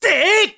Sword Shoot; Skyward Sword; zelda

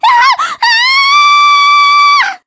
Tornado Scream; Zelda screaming, captured by the black tornado; Skyward Sword

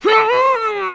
Lift Struggle; Link struggling to lift an object; zelda

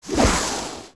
Lightning Throw; Phantom Ganon; OOT

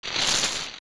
Crawl; Gohma

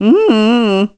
"Hmmm"; Sturgeon; Zelda

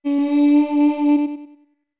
Notes Voice D long; OOT

